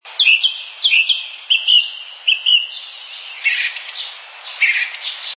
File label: turdus philomelos